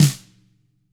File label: TAMA SNRD FLAM; ILIO; Double Platinum Drums 2